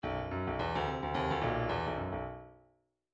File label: midi grooves funk